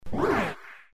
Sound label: tada; brutskvorky